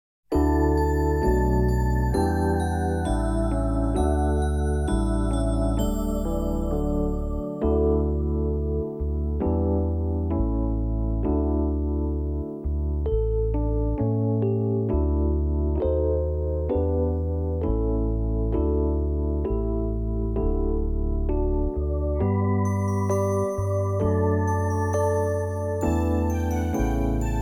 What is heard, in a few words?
backing; 2024 nativity